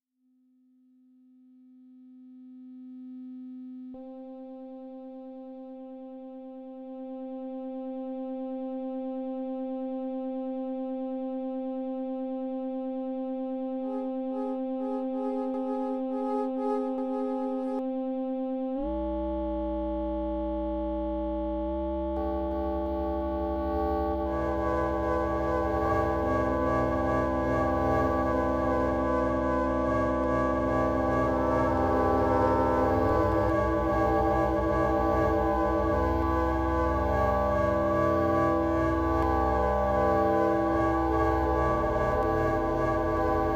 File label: live noise